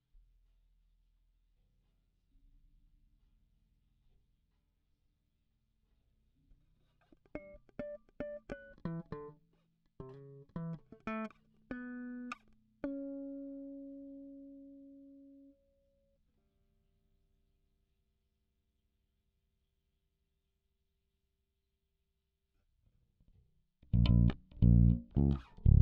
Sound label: Bass DI